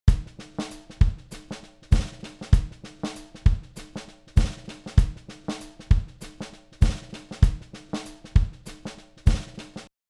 drum

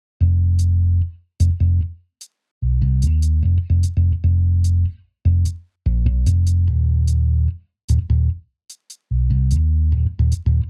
bass on

